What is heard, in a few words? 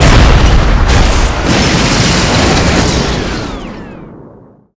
GOBLIN MECH DEATH; BOSSES